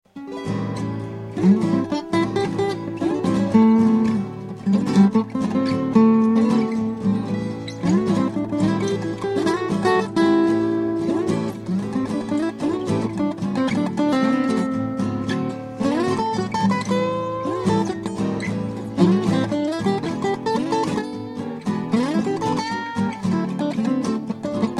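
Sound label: motiv blues, guitar; a7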